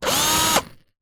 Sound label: Drill